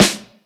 piercing snare one shot g key, Royality free snare drum sample tuned to the G note